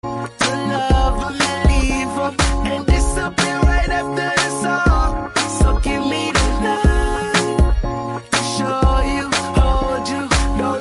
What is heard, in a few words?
SLOWED RINGTONE